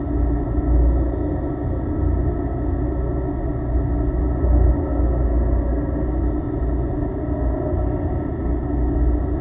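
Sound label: deathmaze loop, ambient soundscapes; dota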